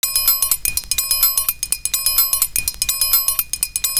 A TRIANGLE, loop; Univers Sons

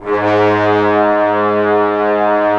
BRS Tbns; Roland - LA Composer vol. 4

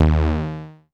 Reece bass; Big Fish Audio; Old Skool House; OSH Bass

